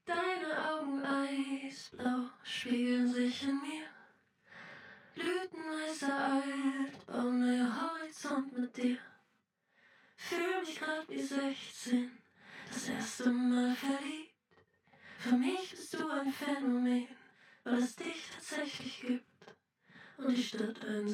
Reverb Back Side Walls

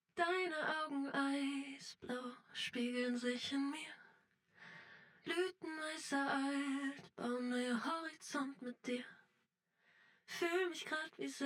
Reverb Side Walls EQ

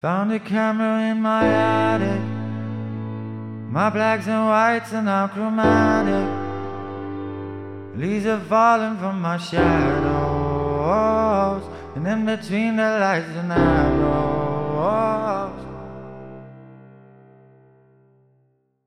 Sidechain Compressing

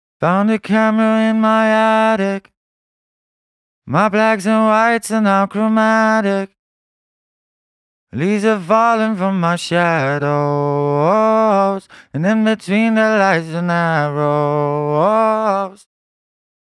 vocal compression after

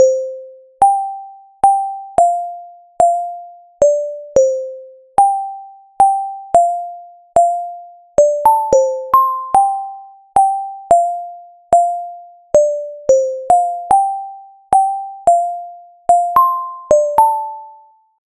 bell dry

